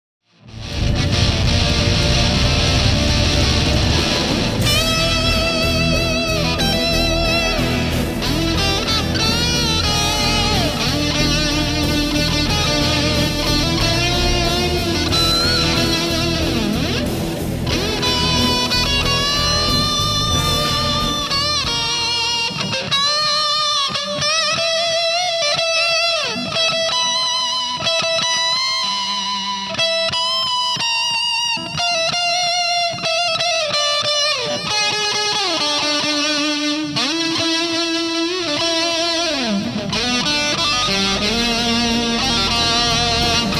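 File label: guitar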